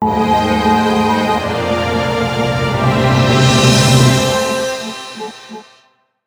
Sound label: Energy Crystal Pickup Mini Fanfare; Windlands; PS4